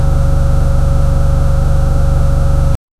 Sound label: loop car engine sound